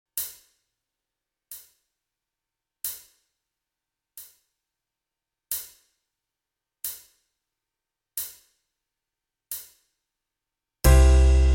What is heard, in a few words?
band